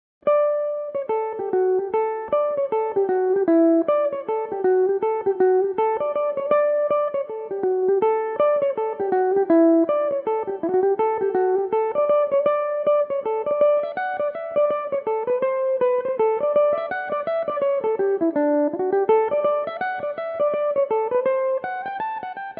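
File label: talisman reel D major, irish mandolin; Belmuse EM-190 electric mandolin; The Talisman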